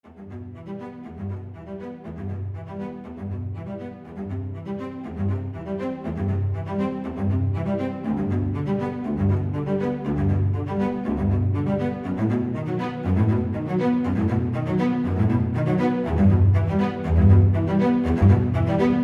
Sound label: cinematic cello arpeggio trailer; cinematic trailer music